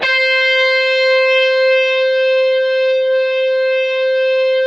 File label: GIB SG C5, DIST GUIT